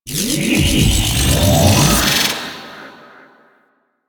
Sword Finisher Glow Orb Pull; Warframe; Quests; The New War; Teshin

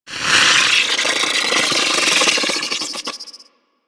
Obelisk Particle Swirl; Warframe; Infested Eris

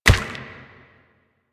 Proto Infested Runner Step Default

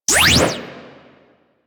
Sicarus Prime Fire 2D, weapons; DE; Warframe

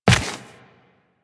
Run Dirt, Footsteps; Warframe; Tenno

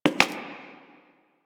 Human Loid Foley Step; Characters; Warframe

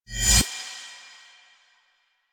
Choir Eyes End; Warframe

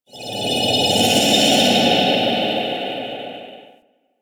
Ambience Hub One Off; Syndicate Codex; Warframe; Tenno

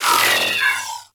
Ship Data Scan Stop; Ambience; Props; Warframe; Corpus Ship Remaster

